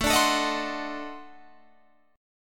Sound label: D 7th Flat 9th A, chord